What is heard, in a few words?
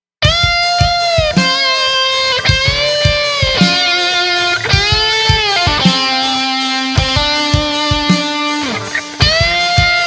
String Bending Lick, guitar